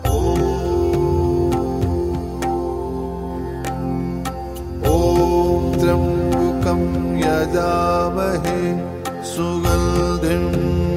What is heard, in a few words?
Maha Mrityunjaya Mantra